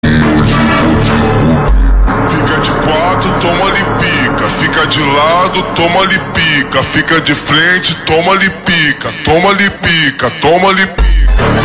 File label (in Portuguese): ORQUESTRA SINFONICA